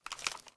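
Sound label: Inspect Movement; Valorant; spectre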